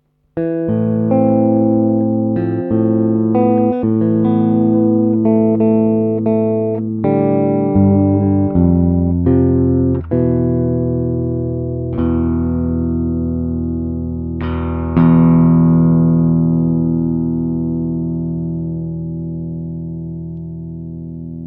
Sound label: eg drive